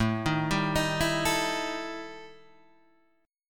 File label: E Minor Major 7th Double Flat 5th